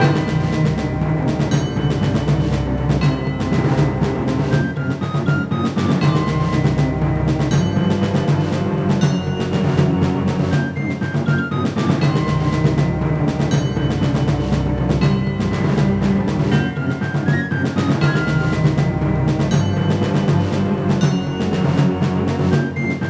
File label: Boss Duel Music Bad